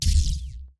decision, button

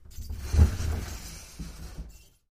fusuma open

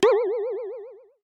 stupid

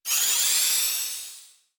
magic statusup

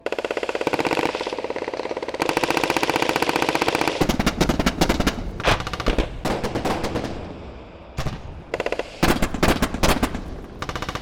land battle

